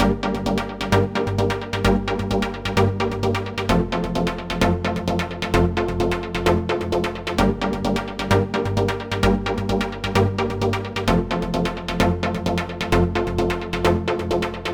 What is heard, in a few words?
ThirdsStabPattern; echolab